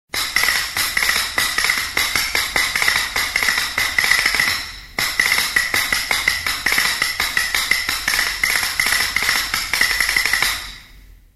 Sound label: castanet; percussion instruments